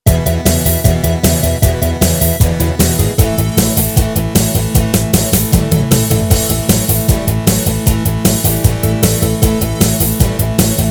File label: beat